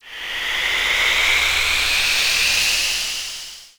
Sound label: sfx sci fi; Masterbits - Switch FX